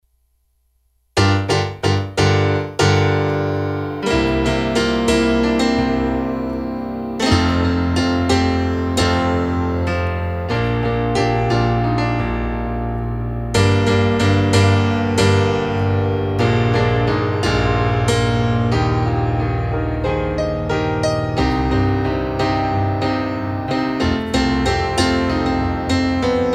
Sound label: Piano